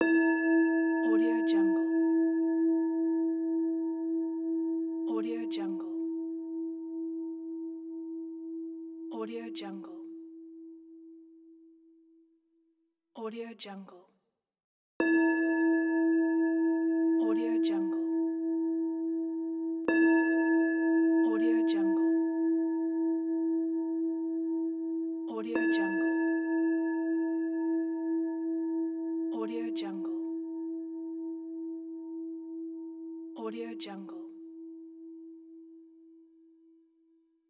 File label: tibetan bowl